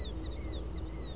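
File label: Killdeer, nocturnal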